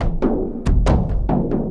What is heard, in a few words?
Doonk Geenk Ah Woonka Woonka, Drums, Frame, Kendang, Short; Sonic Foundry (Sony Creative Software); Friendly Fire